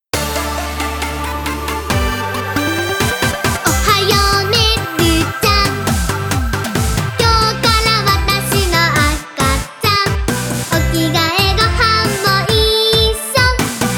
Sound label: dance full solo